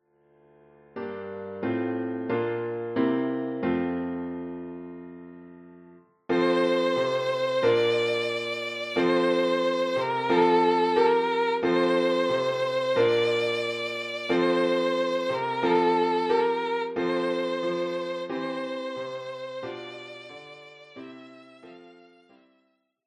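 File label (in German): Violine; O du fröhliche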